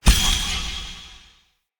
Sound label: Magic Parry Perfect Counter; Casting Devices